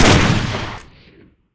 deagle, weapon

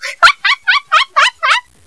haha

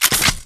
deagle out

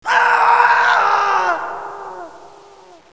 scream

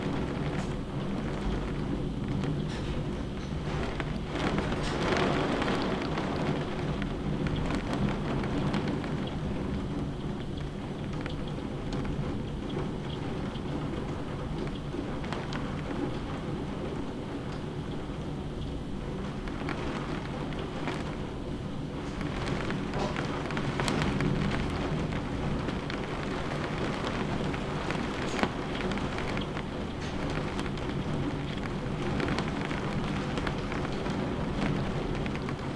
rain

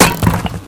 upgrade stone

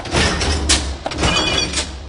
steps atst

